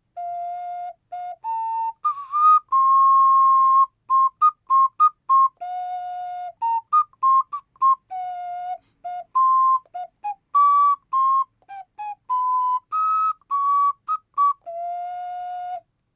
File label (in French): OCARINA 4 TROUS